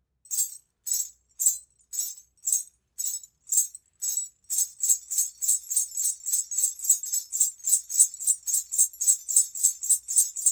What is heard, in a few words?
Bells 1 row pair on velvet anklet; Afroton